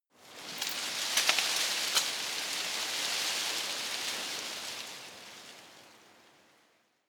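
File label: tree foliage rustle soft, garten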